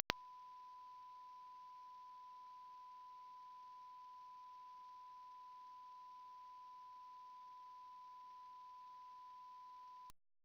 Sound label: sine aptx